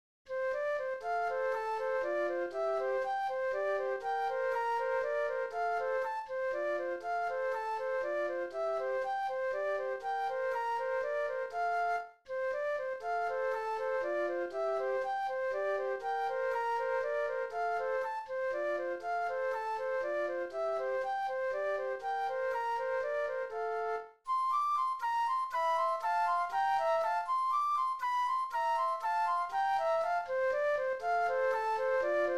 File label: German Dance Flute Duet; classical; Mozart; K. 606 no. 5